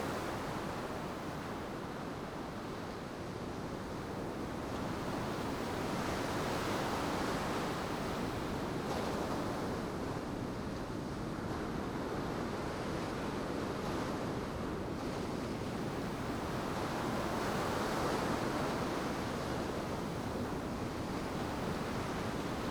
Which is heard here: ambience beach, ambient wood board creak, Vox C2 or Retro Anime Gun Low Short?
ambience beach